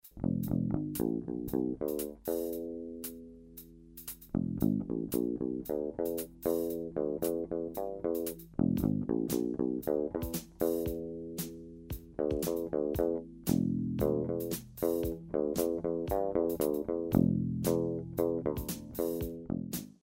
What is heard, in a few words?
LP Triumph